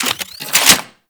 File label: Reload; Crp BFG